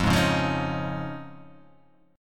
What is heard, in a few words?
F Minor 7th Sharp 5th